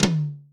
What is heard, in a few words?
tom drum single hit f key; tom drum samples